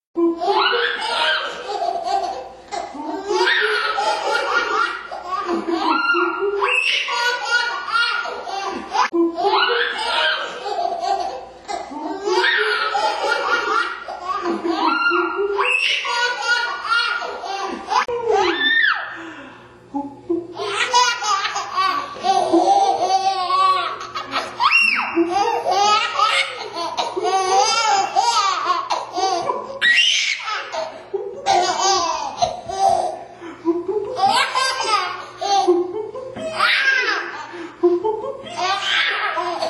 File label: giggle; getting goofy in the kitchen